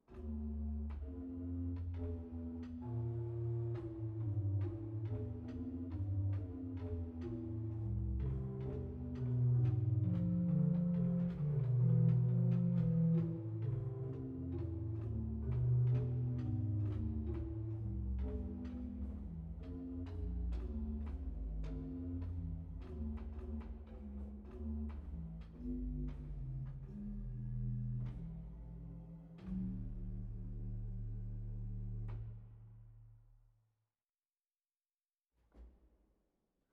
Pedaal Subbas; zwolle

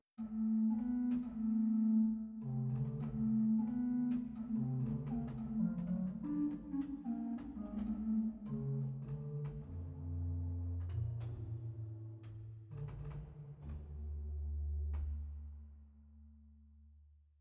Pedaal Holpyp 8; zwolle

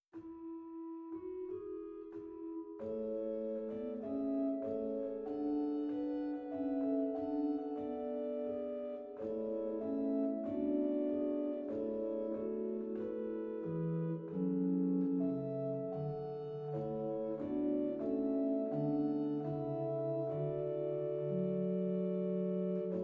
Borstwerk Fluitgedekt 8; zwolle

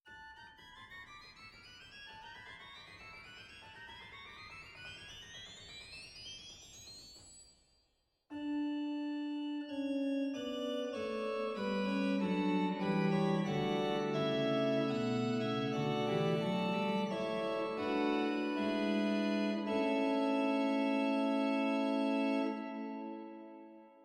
Borstwerk Sexquialtera, zwolle

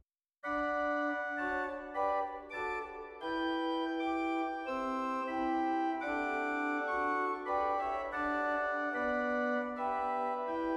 Rugwerk Octaav 4, zwolle

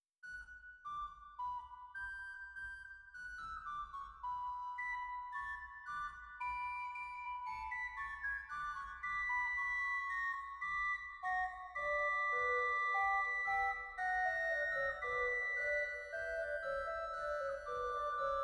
Rugwerk Quintfluit 3; zwolle

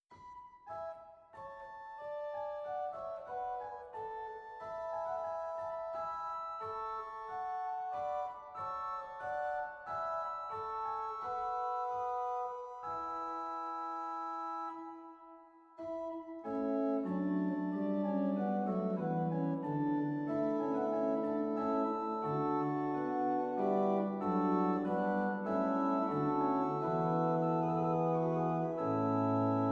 Borstwerk Spitsfluit 3; zwolle

